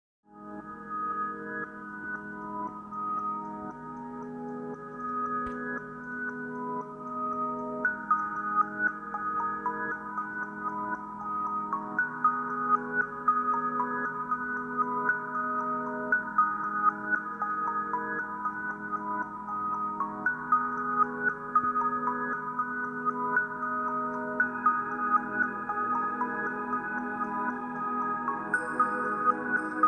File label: Chillstep